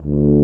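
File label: brass, TUBA C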